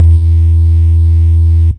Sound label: Hard Resonant Synth Bass, A E2; multisampled